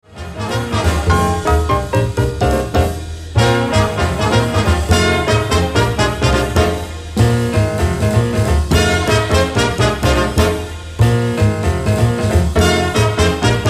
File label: Samba Em Blues